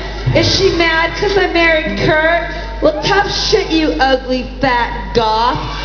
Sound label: yell